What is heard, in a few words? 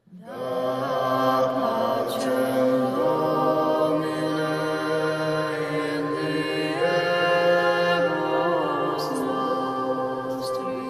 A Templar Chant; Da Pacem, Domine